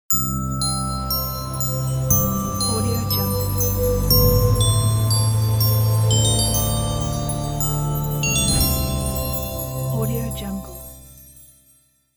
christmas bells logo; supersoundfactory

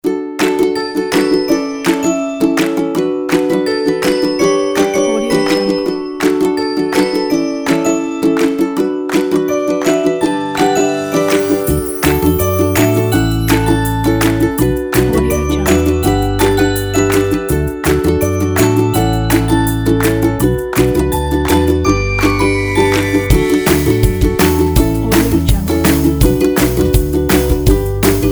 happy

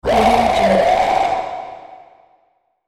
demon scream voice

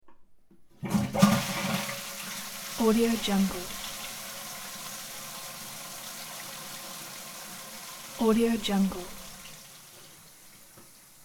toilet flush; supersoundfactory

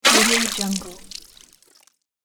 game bloody enemy strike

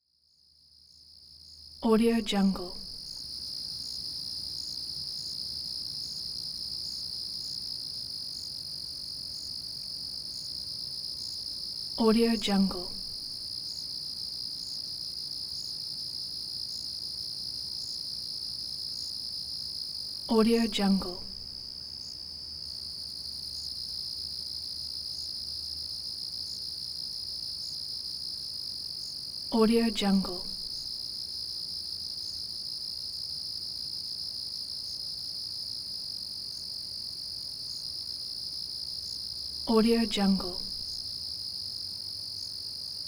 summer night crickets; niksun76

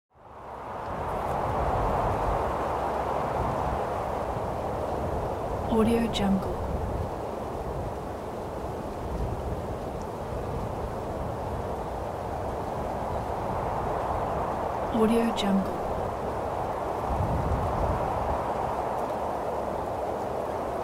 desert

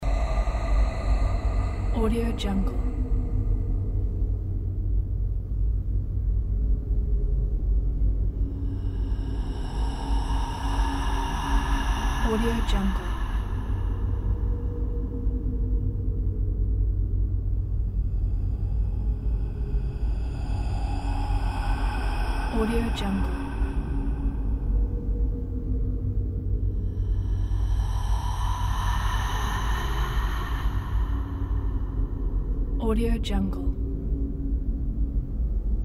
supernatural dark ambiance

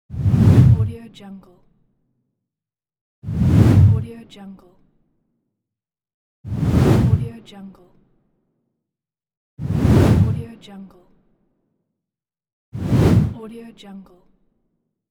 whoosh sounds

